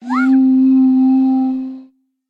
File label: Nose Flute; Instruments; Ableton; Factory Packs; Lost and Found